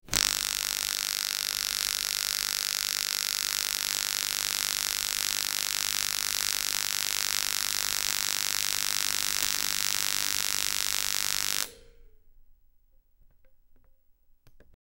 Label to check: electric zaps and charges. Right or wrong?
Right